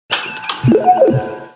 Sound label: com beep, computer